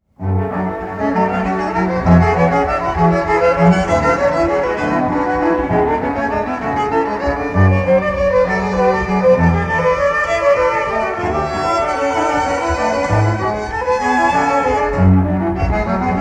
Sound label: quartet